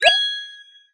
Upgrade Ready; subway surfers city sound effects; Android, iOS

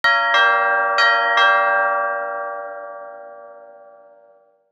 Door Bell; Smeshariki Cartoon Studio

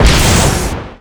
Enemy Fashion 1st Hp Damage Hit

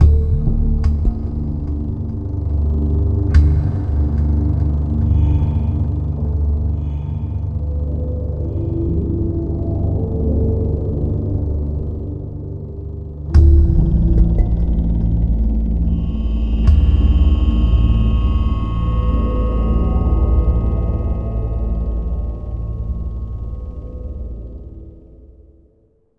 Amb Indoor

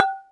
Peeping - Knock Glass; Neighbours from Hell Console Edition; GC, Xbox

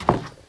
Step; Mothersday; Neighbours From Hell Console Edition; GC, Xbox

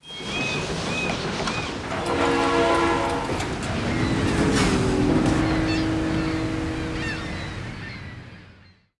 Unlock Cinematic Harbour; Subway Surfers City; Android, iOS

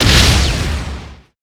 Timebomb Explosion; gamerip

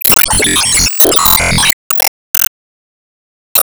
Ball Hit Stage last

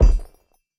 Movement; Going Under

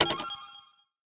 autosave

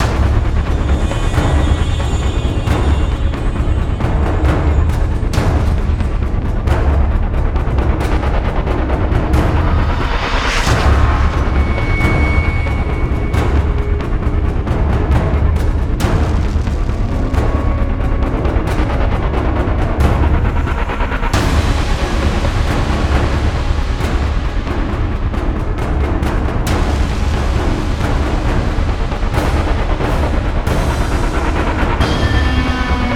Base Loop low

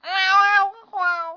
Death Mouse; Miner Max

